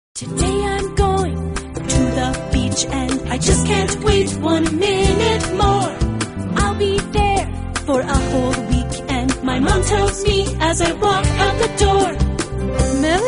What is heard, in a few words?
melody